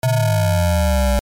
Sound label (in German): ringmod kurz, synthesizer